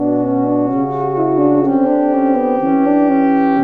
horns white, sad loops

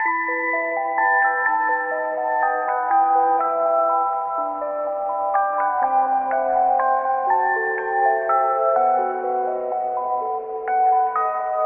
keys sleepytime, Sad Loops

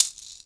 uke

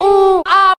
"ooh ahh" male

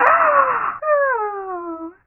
"ah ooh"; Voice; ooh ahh

